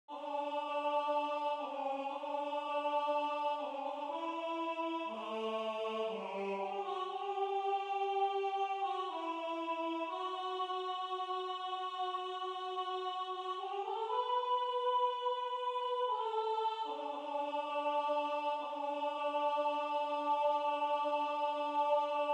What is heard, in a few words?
OBOE voce